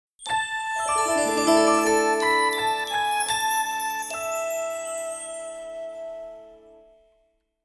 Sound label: magic music